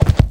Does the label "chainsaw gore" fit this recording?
No